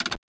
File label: hot; LG GD900 Crystal; Keytone sounds; Bee-beep